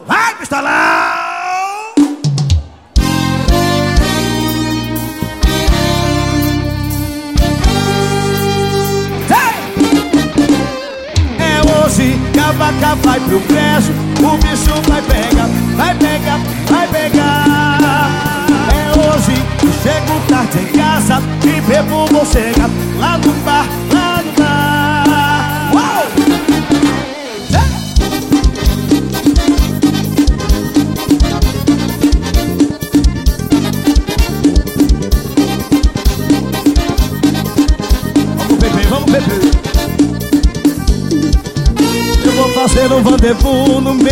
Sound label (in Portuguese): forró